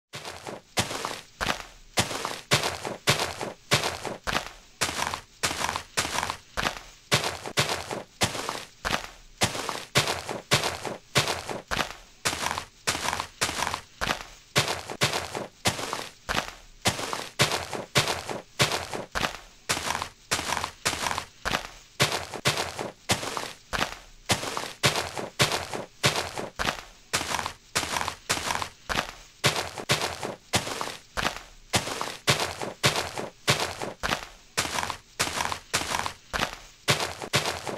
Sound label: grass break sound; minecraft